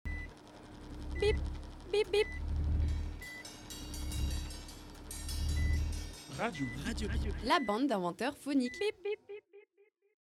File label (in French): Jingle; ALJEP; BIP